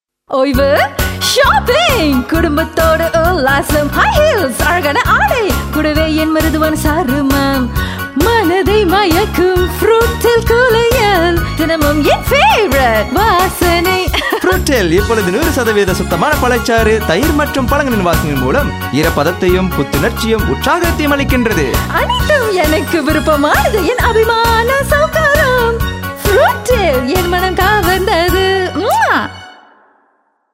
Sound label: Jingle Tamil; 30sec; Fruitale